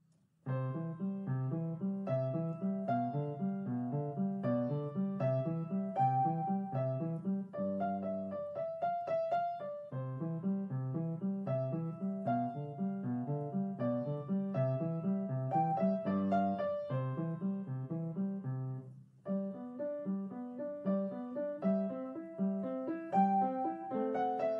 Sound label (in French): menuet